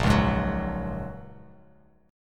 A sharp Minor 7th Sharp 5th A sharp, Bass Standard; strummed; 6,4,6,11